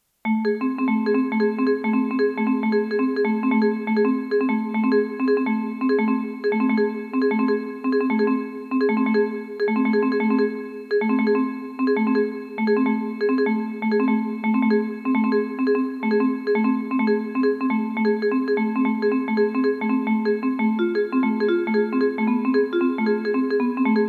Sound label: Wave Flow Vibes; modern compositions